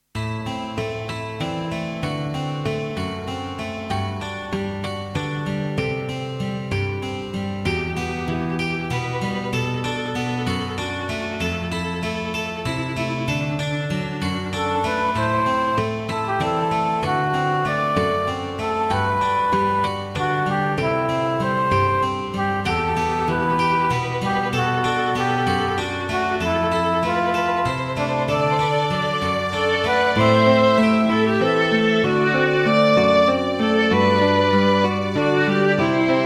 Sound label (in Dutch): fusion, Genieten in een hoekje van de tuin.; AG fusion